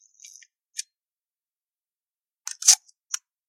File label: foca